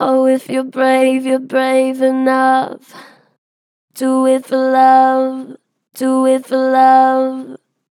Str Flat Oh if your brave, Vocals, Pitch Flat Strong